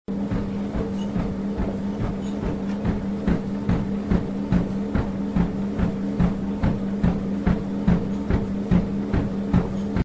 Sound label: treadmill